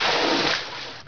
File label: water out; hurrican